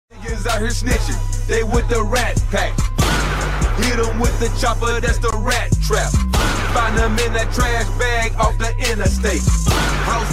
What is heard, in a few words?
fahhhhhhhhhhhhhhhhh